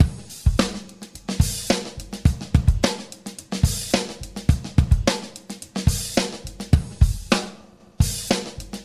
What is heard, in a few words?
sudden stopping, drum loops, funky